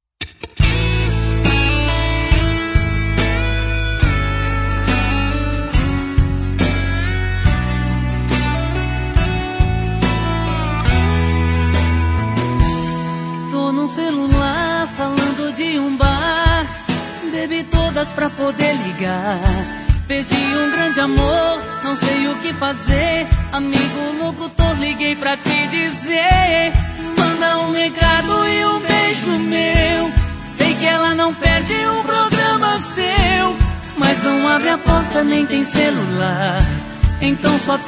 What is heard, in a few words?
Sertanejas; Locutor